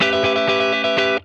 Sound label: A JANGLE, Funk Guitar; Zero G - Funk Guitar